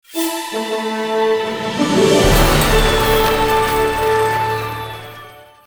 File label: WIN MAX; Fruits Royals